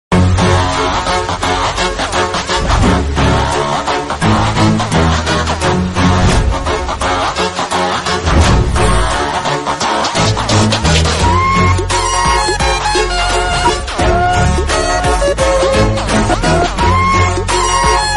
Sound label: bgm remix tamil bgm